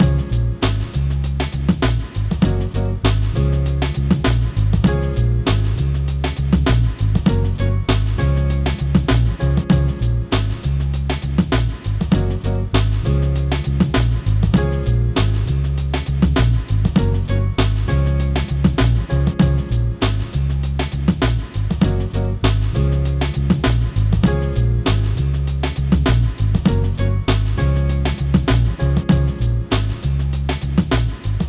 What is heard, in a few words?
Beats